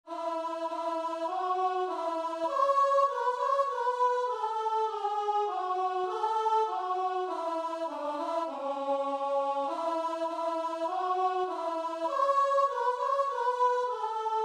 love divine, guitar and vocal

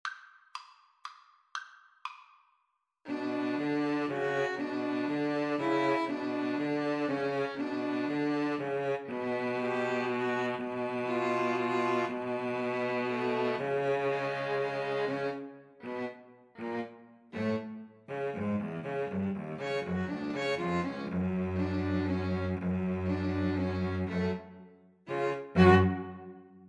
2 Violins Cello; grieg peer gynt

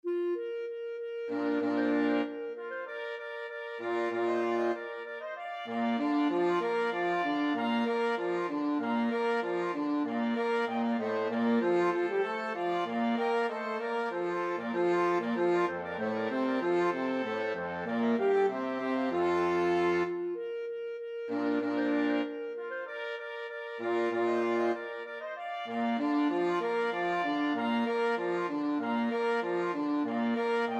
flexible mixed ensemble 4 players

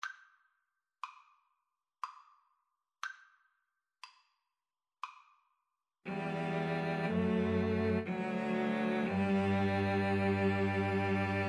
Cello Trio

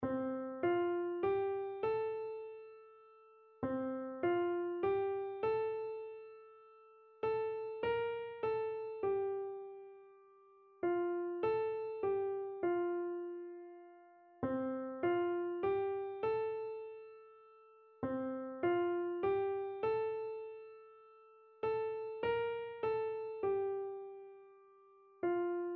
happy day, keyboard

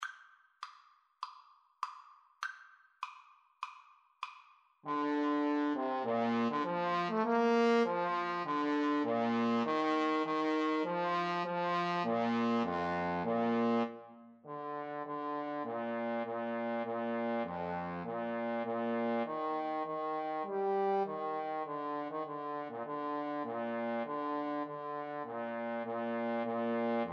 battle hymn, Trombone Duet

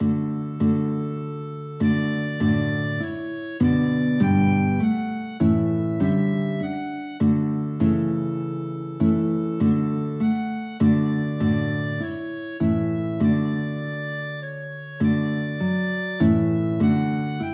guitar clarinet duet

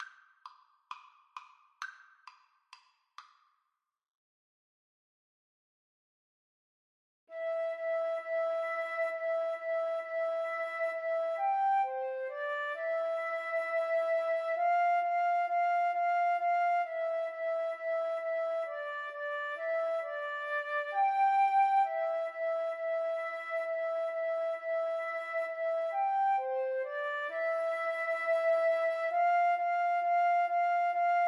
jingle bells boogie, Flute Duet

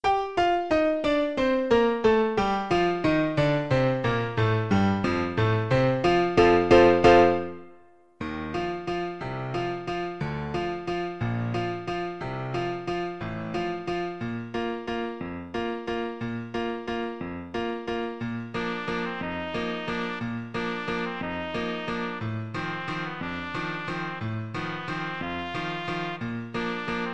lake wal, trumpet

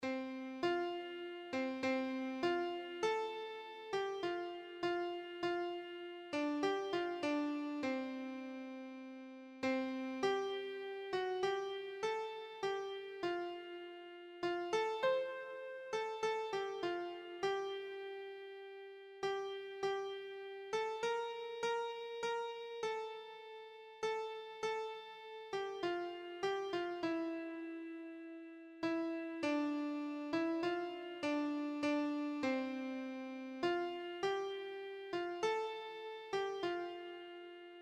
tell the story, keyboard

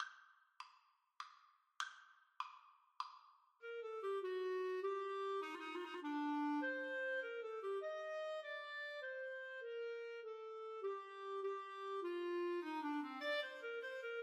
Minuet, Clarinet Duet

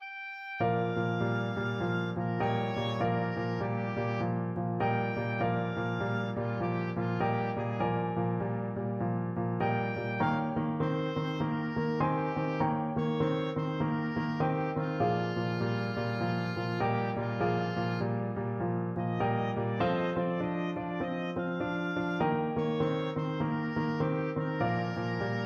cc rider blues, oboe

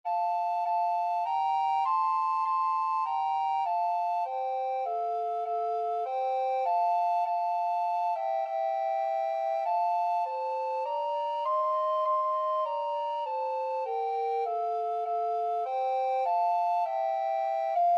2 alto recorder, alto recorder duet

